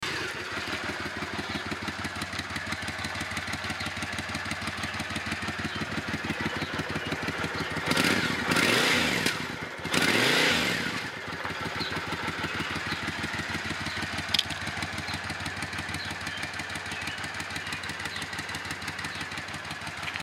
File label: Triumph Scrambler